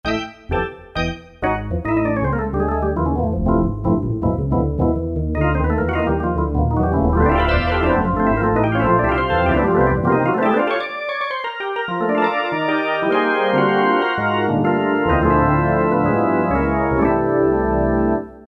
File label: Jazz Organ; Roland PN-D50-03